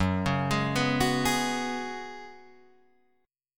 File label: F sharp Suspended 2nd Suspended 4th, Guitar Drop D